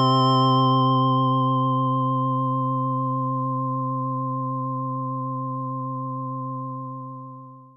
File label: Square Synth